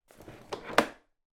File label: household highchair tray remove from chair